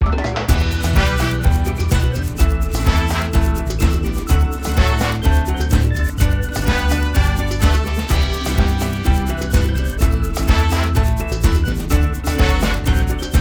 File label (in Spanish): Latido del Corazon